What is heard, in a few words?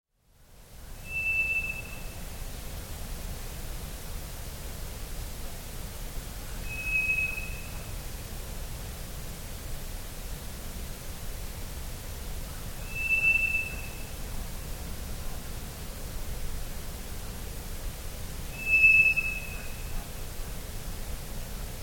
ground thrush